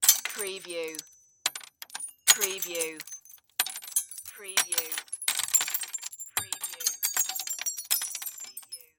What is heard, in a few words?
Debris Glass Drop